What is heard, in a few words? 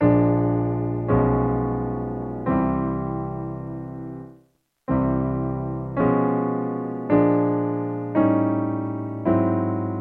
Chromatic Scale Harmonised C To C